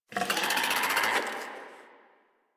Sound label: Infested Charger Idle, Enemies; Warframe